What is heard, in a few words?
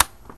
click, Weapons